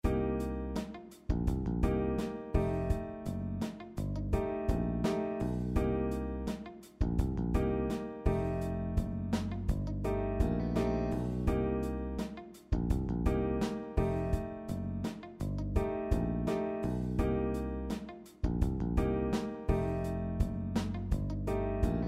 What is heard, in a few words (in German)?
Bandpattern Soul; Popularmusik